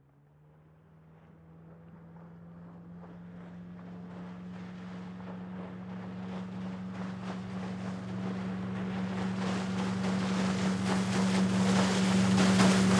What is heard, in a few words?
Dive Raft Pass By, Transportation, Watercraft, Dive, Raft, Pass, By, Fast; Boat Sound Effects; Blast Wave